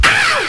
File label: ah loud sting; Voice; ooh ahh